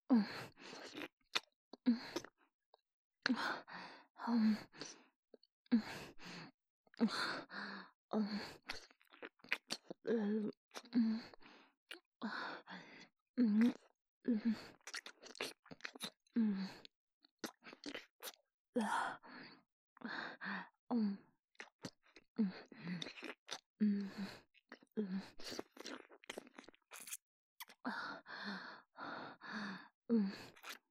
フェラ

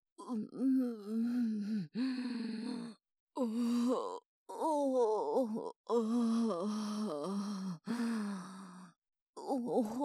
喘ぎ声 オホ声

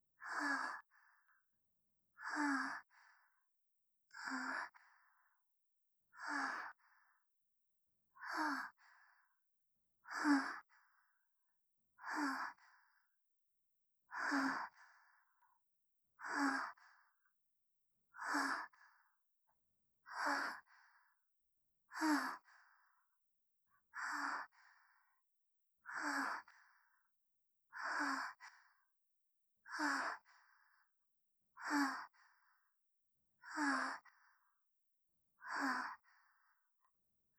吐息

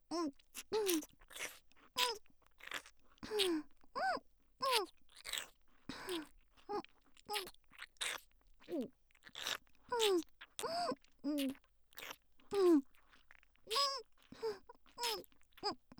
喘ぎ声(3P)

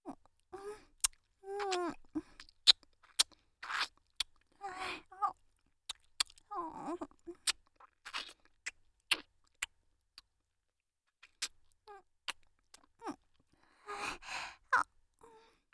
フェラ

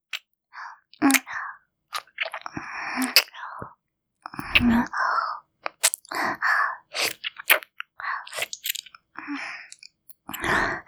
ディープキス

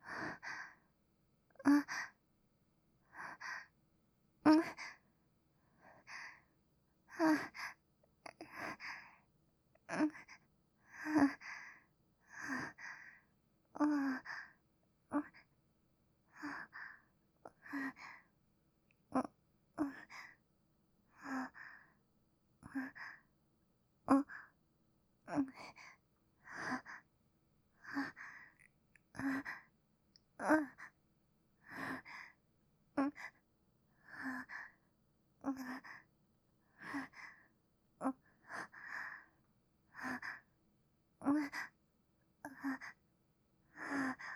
喘ぎ声 極小

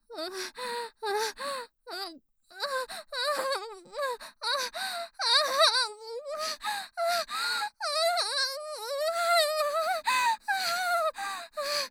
喘ぎ声(極小)

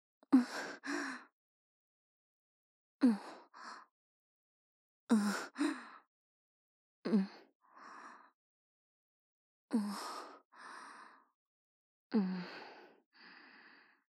喘ぎ声 極小

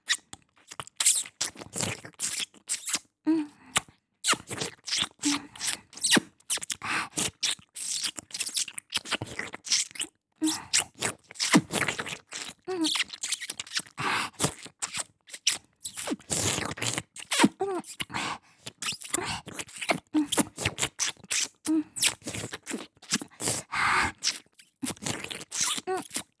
ディープキス

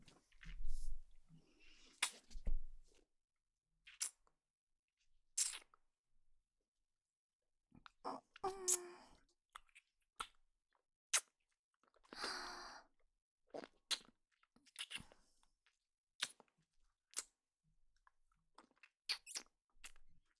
ディープキス音

